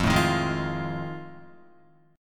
F 7th Sharp 9th, Guitar; F7#9 strummed

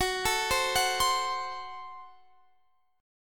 F sharp Diminished F sharp, Guitar Standard; arpeggiated; x,21,19,17,19,20

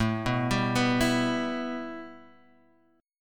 A 7th Suspended 2nd Guitar Open G; 7,4,5,4,5,x

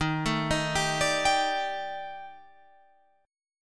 Eb Major 7th Flat 5th, Guitar, Drop D; EbM7b5; arpeggiated

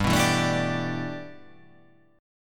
G 6th Add 9th

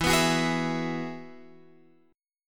E Major Add 9th E, Guitar, Open F; strummed; JGuitar

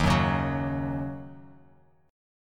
D sharp Minor 7th; strummed; JGuitar

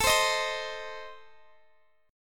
A Diminished, down Guitar Open F; strummed

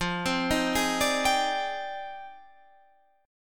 F Minor 13th, Guitar, Half Step Down

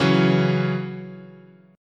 C sharp Minor Double Flat 5th, chord, guitar

